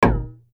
Golf Hit Barrier

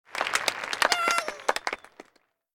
Applause; Toontown Online

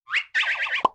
silly pickup; toontown-ceiling

